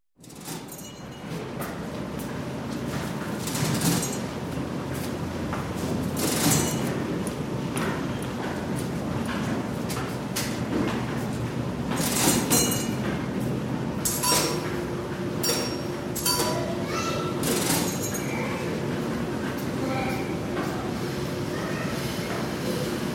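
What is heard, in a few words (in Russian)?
станция в метро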